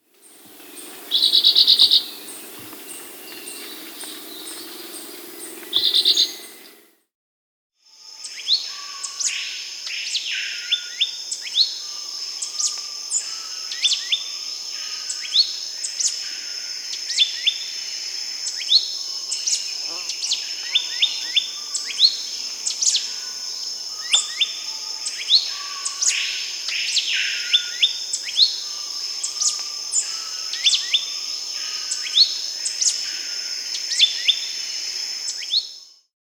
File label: Rufous-bellied Euphonia (Euphonia rufiventris), Peru; S. America